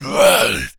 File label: Attack, Zombies; Attacks; zps; Carrier